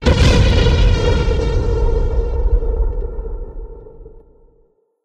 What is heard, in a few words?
circle explosion; ambient; outro; source engine; episodic